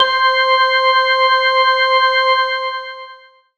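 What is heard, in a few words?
organ, c, House Selection; Synths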